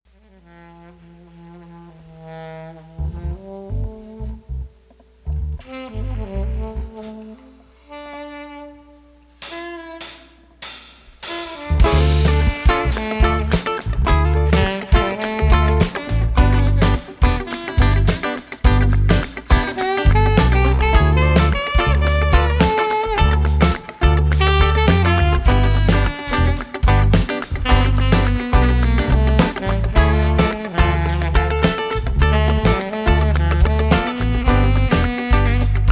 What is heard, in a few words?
alto sax solo over band; Extemporaneous solo on alto sax with unknown band.; Alto Sax Solo Over Live Band; Mike Slinn